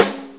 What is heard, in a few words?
Snare, Snare Drum; Drum Tools